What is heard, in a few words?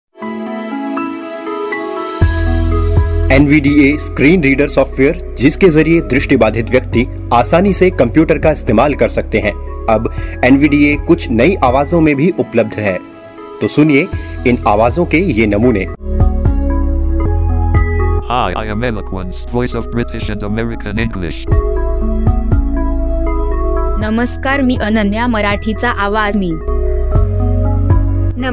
jingle; indo nvda